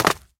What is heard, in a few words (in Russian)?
korotkij medlennij zvuk stezhkov mashinki